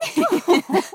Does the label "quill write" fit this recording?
No